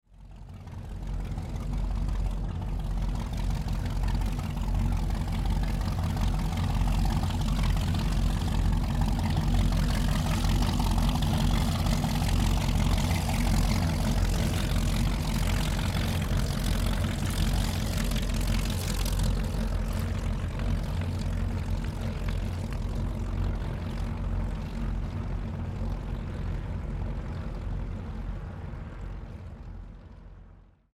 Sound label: Speed Boat Pass By Slow Motor Idle Watercraft; Transportation Watercraft; Boat Sound Effects; Blastwave